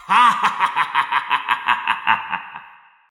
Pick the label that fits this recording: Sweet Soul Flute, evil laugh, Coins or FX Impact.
evil laugh